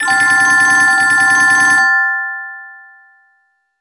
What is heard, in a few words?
Silver, ringtones